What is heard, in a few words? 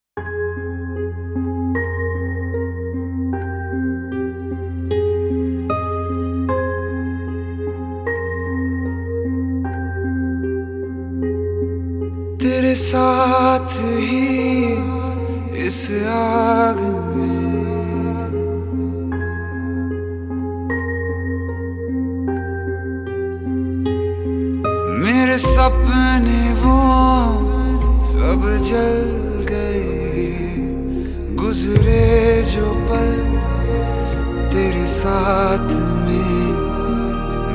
(Sad Version); Kaabil